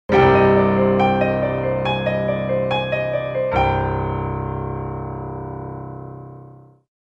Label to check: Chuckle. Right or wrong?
Wrong